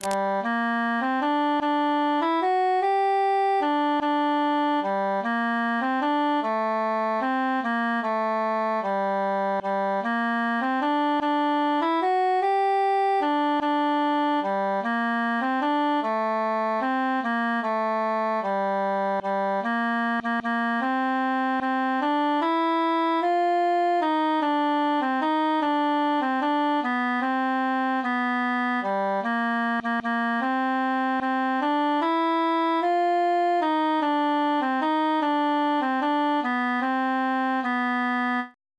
three country dances cantus solo

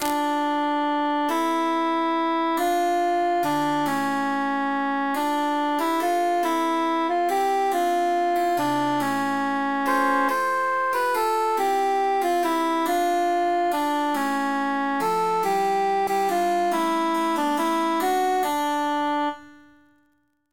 treble emphasized; we be souldiers three

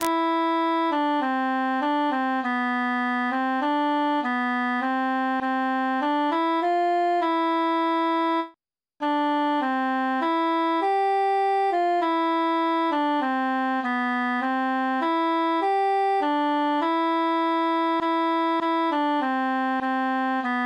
altus solo; we be three poore mariners

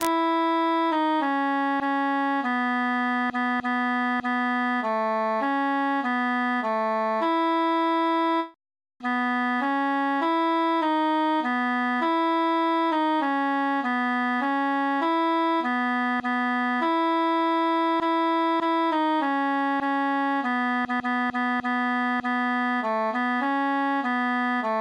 tenor solo; transposed; we be three poore mariners

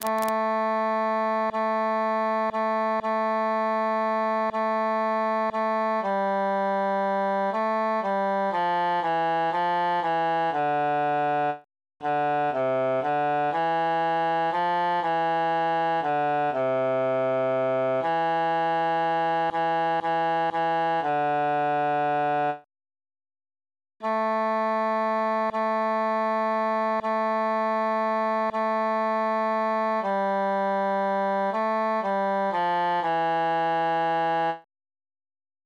tenor solo; son me regarde prennes i garde he mi enfant

